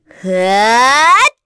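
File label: Vox Casting, Korean; Pansirone; kingsraid; voices heroes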